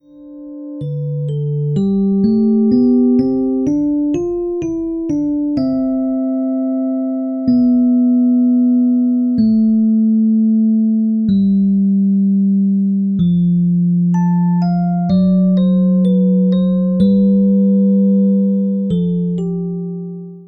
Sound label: Air Piano Solo